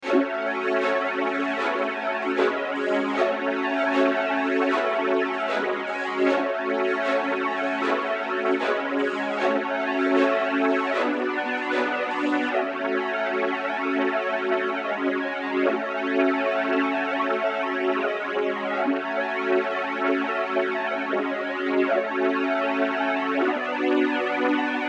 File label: F min 154 BPM Strings